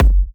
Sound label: F#, Kicks; markus hakala